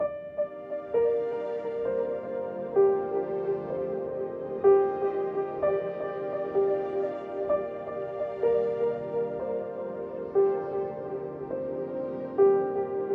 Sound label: piano; malmo